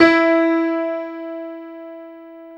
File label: PIA MICRO, MICRO-PIANO, Roland